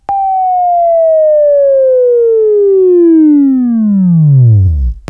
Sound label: Buzz